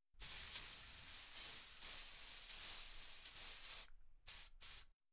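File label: air freshener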